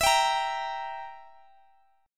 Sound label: E Diminished E down; strummed